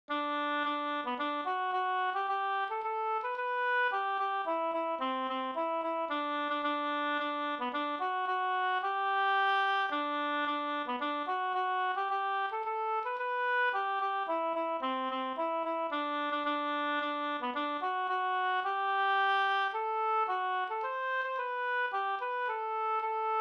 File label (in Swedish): hamburska G dur for sang; Gula skinnbyxor Sd